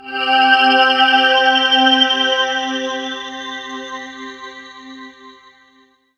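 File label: synth Morpheus; Sound & Vision - Gigapack II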